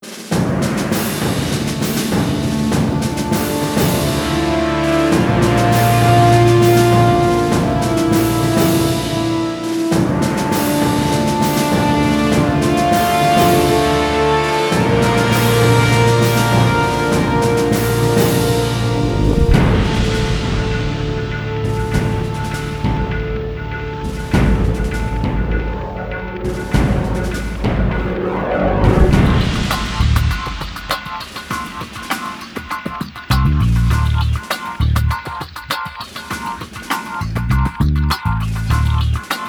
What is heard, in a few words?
MULTIPLAYER VICTORY; frontlines fuel of war soundtrack 2008